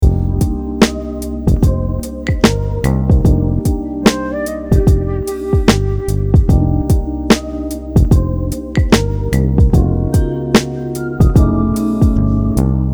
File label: lo-fi, smooth jazz guitar; audiolounge soft